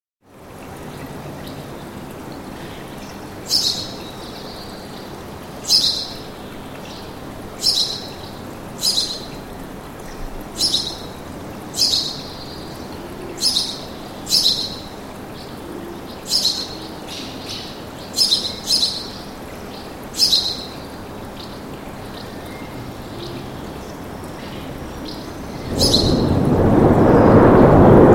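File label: sounds of birds singing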